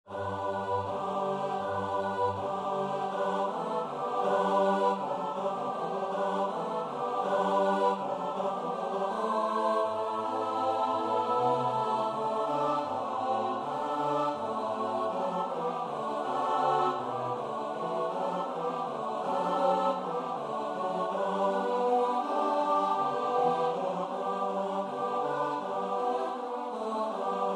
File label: SATB; korsats